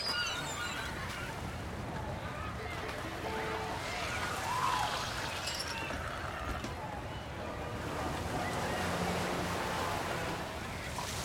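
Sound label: Splash Party Ambience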